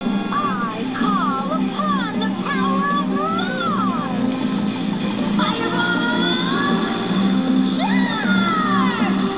fireballs charge; "Mars Fireballs Charge!"

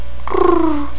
croon

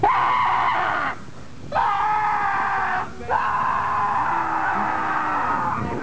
screams

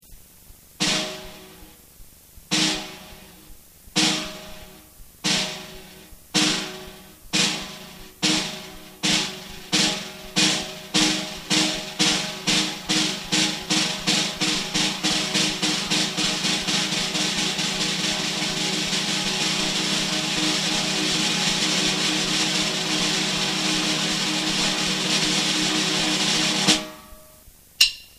buzz Roll

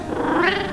meow

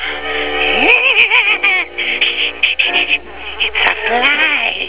fly

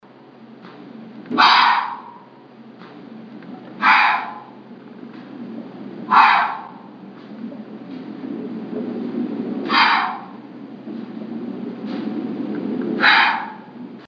DEER SNORT

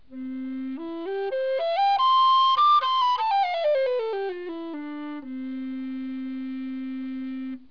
recorder tenor range

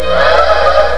dragon

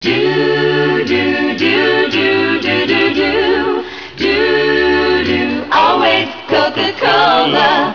jingle

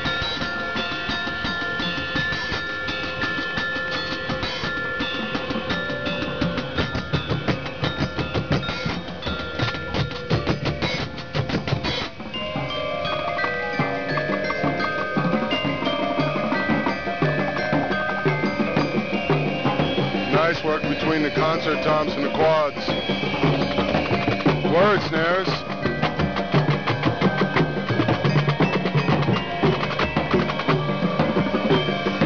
word snares; fhndrumline

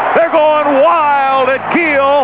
Blues exclamation

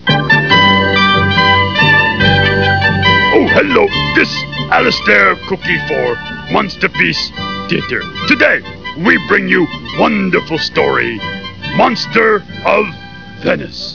monster

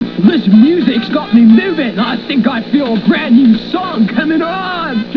movin music; "This musics got me movin', I feel a brand new song comming on!"

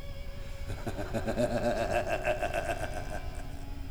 laugh; chopper

